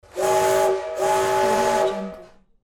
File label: steam train whistle